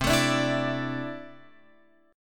C 6th Add 9th C down Guitar Open F; strummed; JGuitar

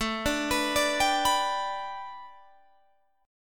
A 9th Suspended 4th, Guitar Open G

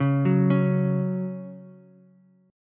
Gb 5th Gb down Ukulele; Gb5 arpeggiated; JGuitar

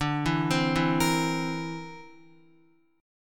D 7th Suspended 2nd Sharp 5th D, Guitar; D7sus2#5 arpeggiated

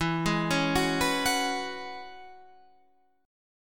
E 6th Add 9th E, Guitar Standard; E6add9; arpeggiated; 12,11,11,11,12,14; down; capo=3

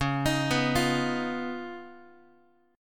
C sharp 9th C sharp down Guitar, chord; C#9; arpeggiated; JGuitar

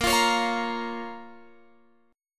A sharp Minor, down, Guitar, Open F; strummed

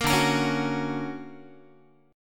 D Augmented Major 7th down Guitar; D+M7 strummed; JGuitar

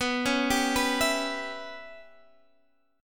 C sharp Minor 7th Flat 5th B

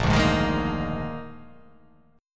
A sharp 11th

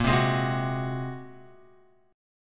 Bb 7th Suspended 2nd Sharp 5th Bb, Guitar; Bb7sus2#5; strummed; x,1,0,1,0,2; JGuitar

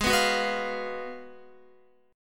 Ab Minor 13th, Guitar; Abm13 strummed; JGuitar

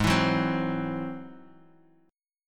Ab Augmented 7th Ab Guitar Open D; 6,7,4,6,x,x

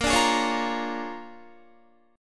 Bb 7th Sharp 9th Bb Guitar, chord; 18,17,18,18,17,17; 4E,4A,5D,5G,5C,6F; Bb7#9 strummed; JGuitar

